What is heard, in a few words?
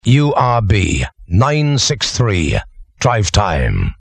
jingle, no bed; drivetime